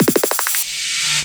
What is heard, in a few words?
PERC LOOP fx